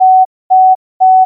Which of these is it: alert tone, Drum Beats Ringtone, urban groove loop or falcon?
alert tone